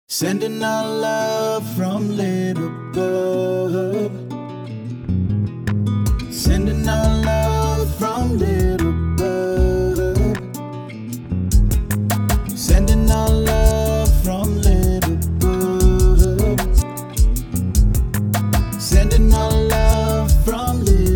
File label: jingle; Little Pub